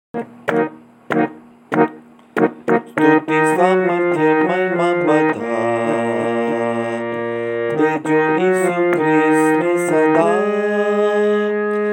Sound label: Choir; 527 Stuti Samrthay; Rajkot C.N.I. Choir